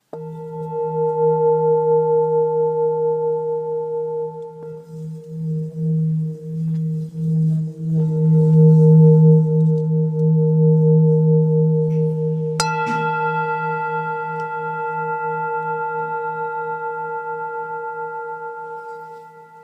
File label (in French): bol bengale Mi